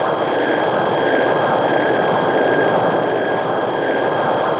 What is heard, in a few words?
WEIRD BUZZ; AMG - Vince Clarke Lucky Bastard